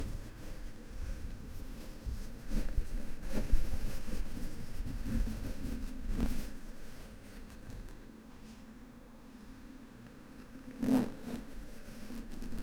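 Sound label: asmr smooth ball continuous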